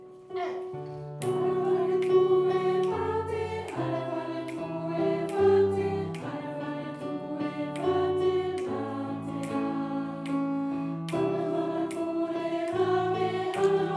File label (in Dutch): SOPRAAN; SINT-CECILIA